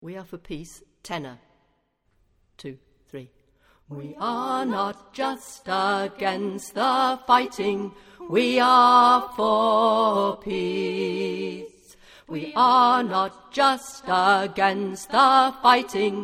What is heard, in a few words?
tenor